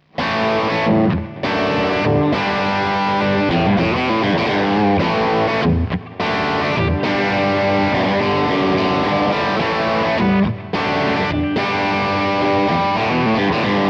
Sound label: Crunch; Sire Guitars Larry Carlton H7V Demo